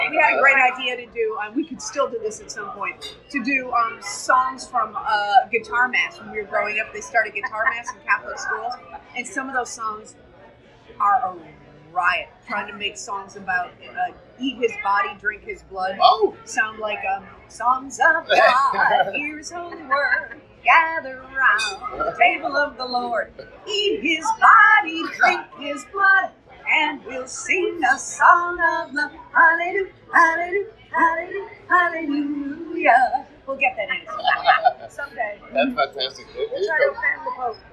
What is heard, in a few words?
singing